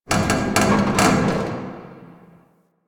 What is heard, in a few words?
Car Creak; Ambience; Props; Warframe; Nineteen Ninety Nine